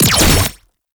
gunbrella alt mech; Weapons; Warframe; Tenno; Koumei

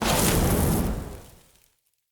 Short Sword Fire Whoosh; Weapons; Elemental

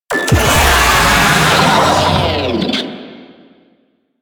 Player Spotted, Vocals

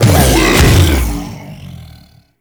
Bowl Start B; Warframe; Grendel

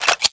Clip Out, Weapons; Furis

